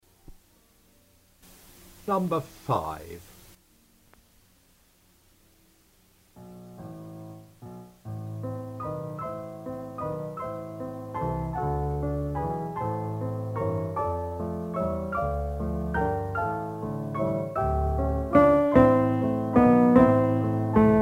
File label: Lieb Ten, tenor; brahms; liebeslieder